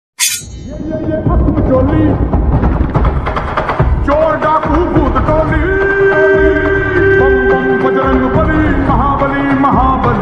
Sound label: bhakti ringtone hindi ringtone; hanuman ji; bajrangbali